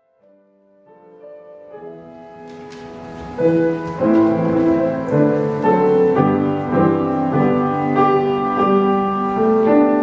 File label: piano